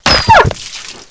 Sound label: big impact